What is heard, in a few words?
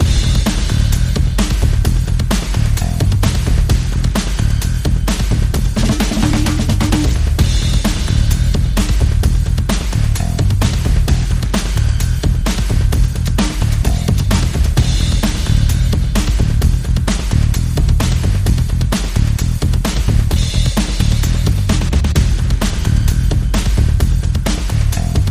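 bass and percussion only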